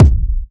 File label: Kick - C#; Kicks; Future Bass; Drums; One Shots